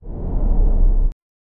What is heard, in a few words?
short eerie impact sound, no melody